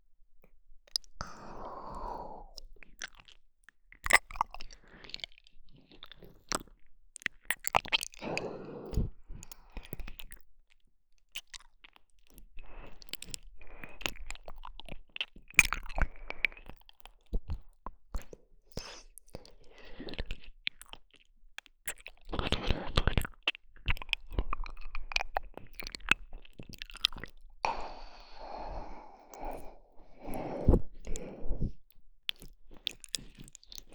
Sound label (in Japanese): 耳舐めのみ（右耳）